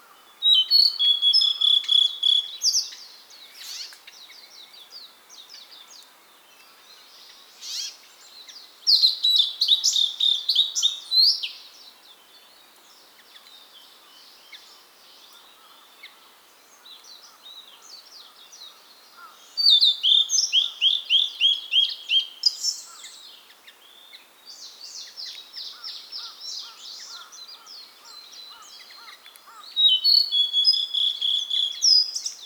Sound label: Blue-and-white Flycatcher Cyanoptila cyanomelana